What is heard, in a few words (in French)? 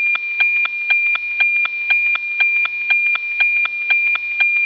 Noaa 12, Satellites bande 137 MHz; Meteor - NOAA